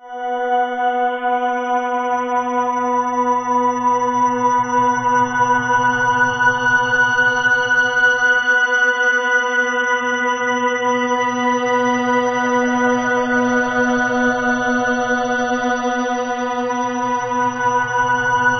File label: HI FREQ, Ominous Drones; USB Soundscan vol.48; AKAI